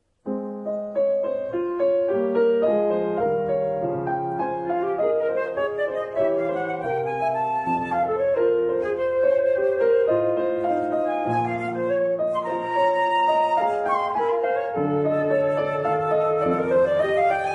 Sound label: Flute Sonata